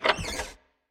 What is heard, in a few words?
Office Door Open; Prison Architect